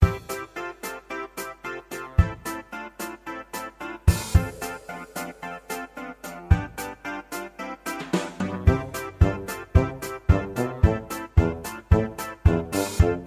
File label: Dizzy Jazzy